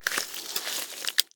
wave tentacle; smite; cthulhu

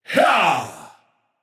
battle cry; camazotz

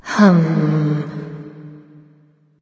thinking light; smite; voicepack; hel

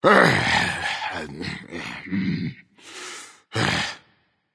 grumble; smite; vamana

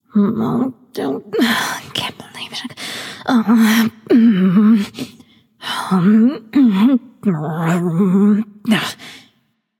grumble light; smite; voicepack; hel

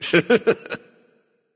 "yay"; smite; merlin

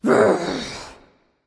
ugh; smite; voicepack

